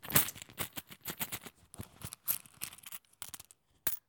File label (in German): Klirrende Münzen